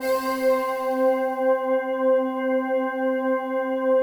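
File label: PAD, atmospheric pads; Best Service; XX Large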